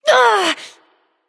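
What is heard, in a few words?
grunt hit, female; smite; voicepack; hera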